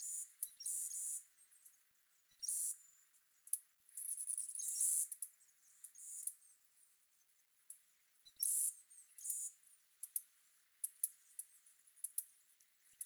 Critter Loop, Glades; Ambience; Glades Of Andurin

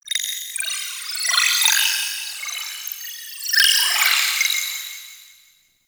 Droid Array One Off; Warframe; Cephalon

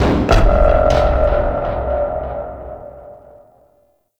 Lab Equipment Bang; Warframe; Ambience; Entrati

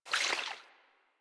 Vial Foley; Warframe; Warframes; Alchemist

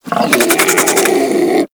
Infested Charger Idle; Warframe

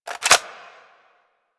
Clip Out, Weapons; Latron

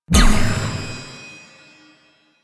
Wave Ability Cast; Enemies; Abilities; Warframe; Duviri; Dax Knight

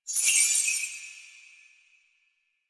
Naginata Whoosh Tonal; Warframe; Weapons; Melee; Koumei Naginata

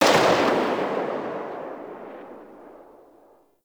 Sniper Rifle Tail; Warframe; Weapons; Corpus

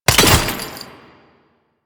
Ammo Bounce; DE; Warframe; Warframes; Frumentarius; AmmoAbility

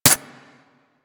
Arch Dual Pistol Low Clip Mech; Weapons; Warframe; Archwing

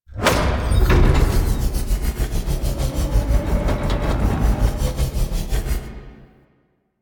Machine Thrum; Warframe; Quests; Entrati Labs; Cavia Rank Up Scenes

